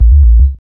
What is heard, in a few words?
BASS; E-Lab; X Static Goldmine 1